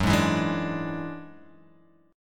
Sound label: F sharp 7th Suspended 2nd Sharp 5th, Guitar, Open G